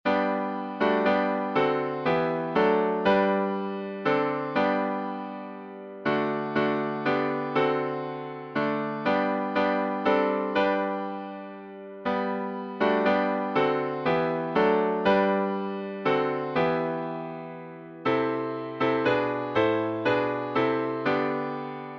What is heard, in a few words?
Hymns